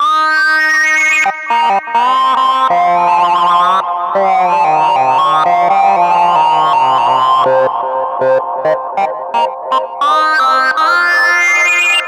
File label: Sync Lead, tc electronic Flashback X4